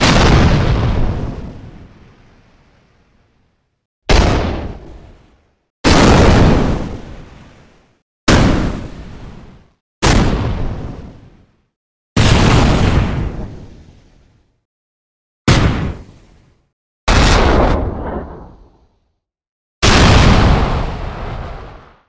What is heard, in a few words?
Explosions Big And Small